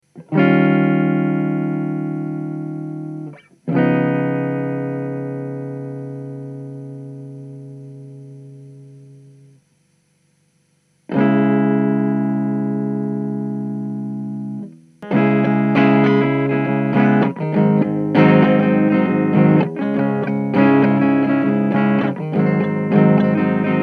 buffer bridge clean

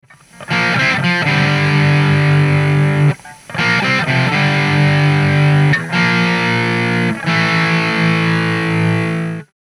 drive

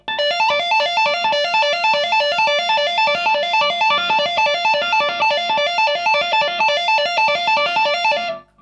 ge200 mesa solo

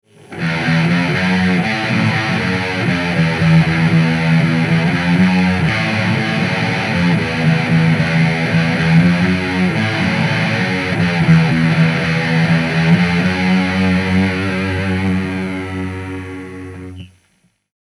dist cho

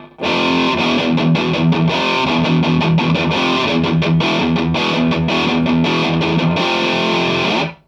Mesa riff

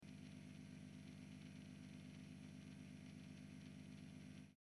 switch bigmuff

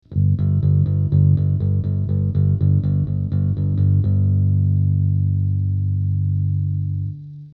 elixer bass

